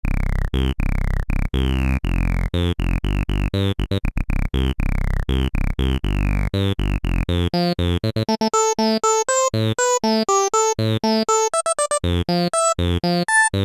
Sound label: e-mu virtuoso 2000 filter, phazeshift2 pha 6pole q075